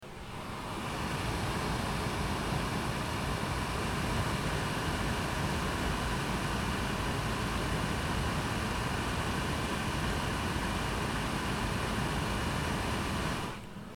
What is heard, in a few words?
cooker hood